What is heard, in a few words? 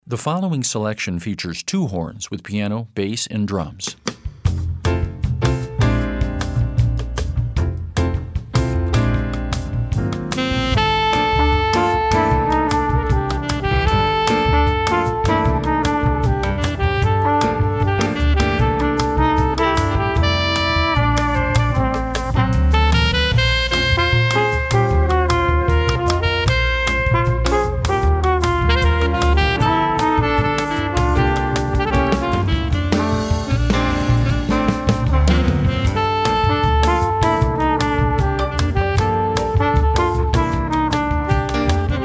Jazz Quintet